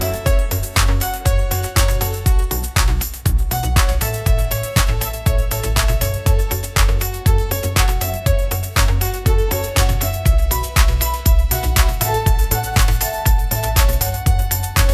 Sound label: bright pop ringtone melody